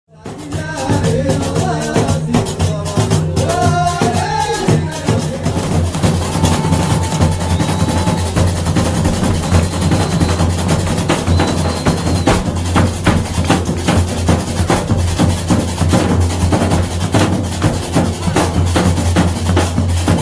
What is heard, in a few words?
gnawa percussions